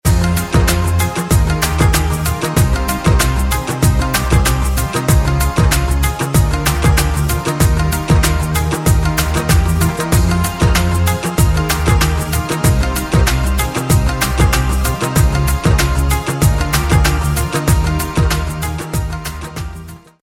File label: Panjabi, ringtones hiphop electro; ghia productions